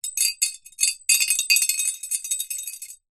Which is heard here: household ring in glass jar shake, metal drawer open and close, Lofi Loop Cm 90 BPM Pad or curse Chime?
household ring in glass jar shake